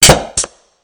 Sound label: winch Small Eject; Kerbal Space Program; KAS